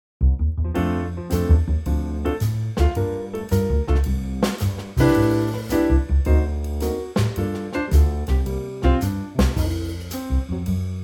Trio

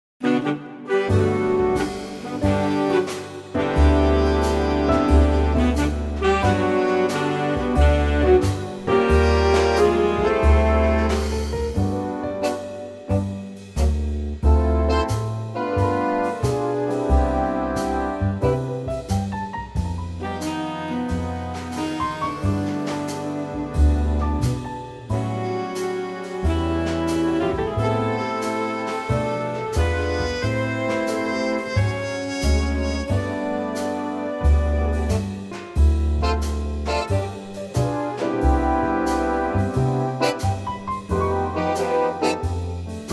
G ballad big band; female

